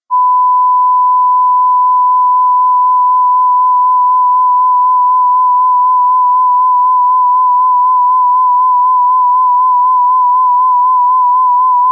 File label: vibrato 1000